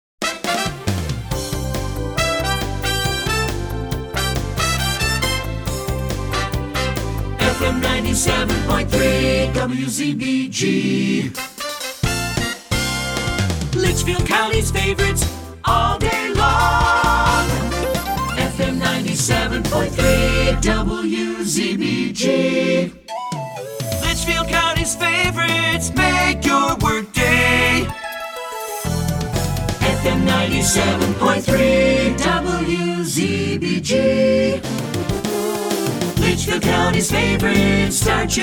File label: Jingle; Short Attention Jingle Show IV